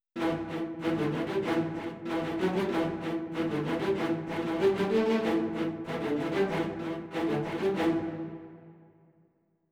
violas; Netease; combat results win

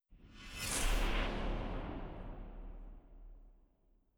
impact; Netease; gank

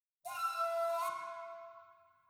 flute fx; Netease; gank

